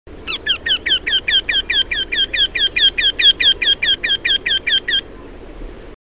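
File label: vertisheyka voice, wryneck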